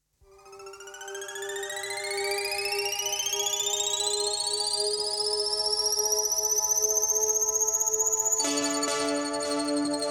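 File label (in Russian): kosmicheskie zvuki, space sounds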